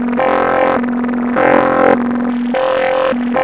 METAL TALK, MACHINE TALK; Zero-G Ambient 1

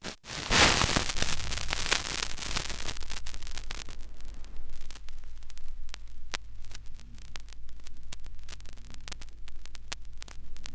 vinyl; Masterbits; Vinyl Attack

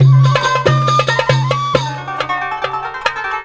Oiental; Best Service; Ethnic Journey Sound Cube

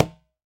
LOW TUMBA, Conga Battery; E-MU Formula 4000 Series Vol. 4; Earth Tones

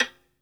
SOLO VI, Synclavier Strings; ILIO

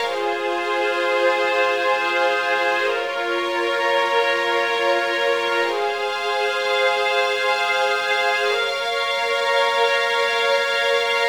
Strings, 70s Analog Funk; Sonic Foundry (Sony Creative Software); What it Is! 70s Analog Funk; Session 15 - Wild Child

